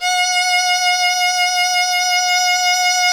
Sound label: Synclavier Strings; ILIO